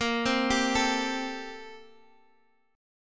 A Minor 7th Bb; Am7/Bb; arpeggiated; chordshape

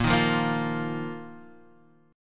A sharp Suspended 4th A sharp

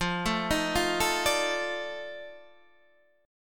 F 13th F, chord

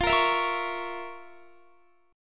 F Major 7th Suspended 4th Sharp 5th F, Guitar; strummed

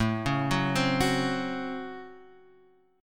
C sharp Major 7th Suspended 4th Sharp 5th, A; C#M7sus4#5/A Chord {5 4 4 5 7 X} arpeggiated

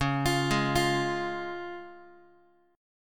C sharp Major, Guitar; x,9,8,6,9,x; arpeggiated; JGuitar

